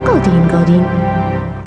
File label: Goldeen, Pokemon crys